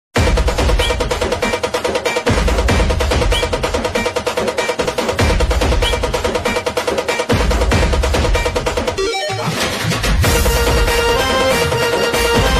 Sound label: banjo theme mix; bhimjayanti